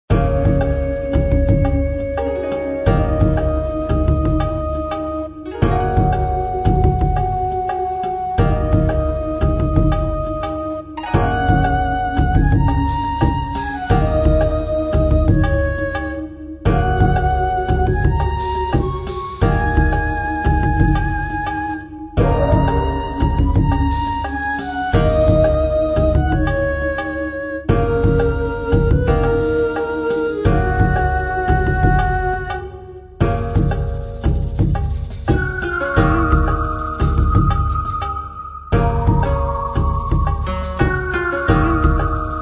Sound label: Title Screen Music